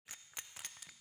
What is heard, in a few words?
tools